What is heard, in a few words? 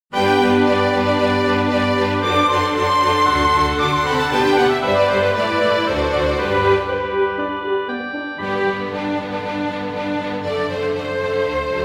flute concerto; classic; mozart; fluteconcerto1